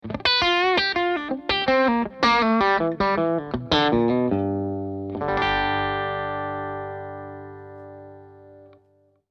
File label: light od lick, guitar